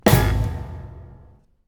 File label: hit